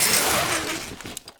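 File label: rappel stop player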